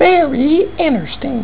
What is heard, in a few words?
inersting-alert; Wacked Sounds Vol1.0